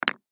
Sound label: Spam Can Set Down; Food Sound Effects; Food (Sound Effects:Household); FOLEY